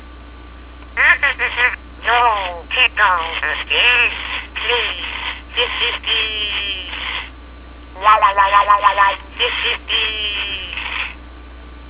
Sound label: tickle